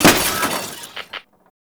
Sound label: electronics misc destroy